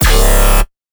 Bass Shot 200 C#

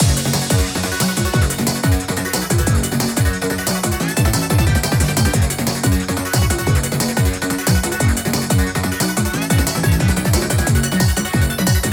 BGM power up; beyblade x xone

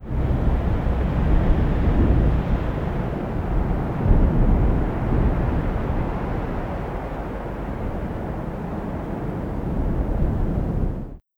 Ayers Wind

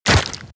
Meaty Deaths

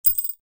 Key drop; The Binding of Isaac; SFX Pickups

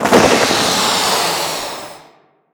UI Scene Transition Start; Skylanders Cloud Patrol; Arcade, iOS, Mobile

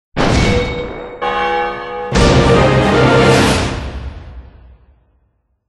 World Start

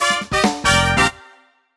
Toy Soccer Additional Time; Clubhouse Games 51 Worldwide Classics

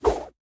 Throw Generic

